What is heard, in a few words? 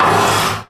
metal frame scrape; Source Engine; Portal 2